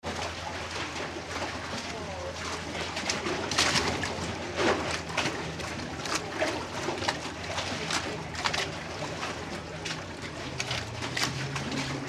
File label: The Thames Under the Mayflower Pub London UK